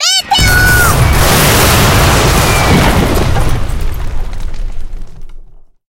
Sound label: skill meteo